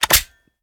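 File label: pistol reload empty fast charge; gmod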